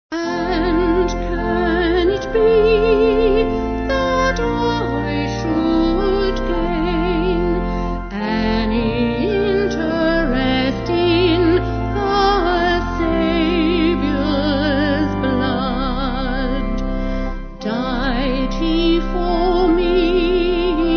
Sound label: F Vocal Organ; And Can It Be; Sagina